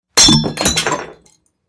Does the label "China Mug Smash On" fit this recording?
Yes